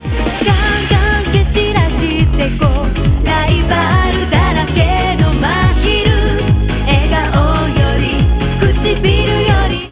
ringer; Ringtones

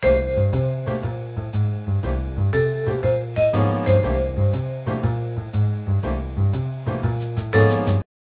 ringer; Ringtones

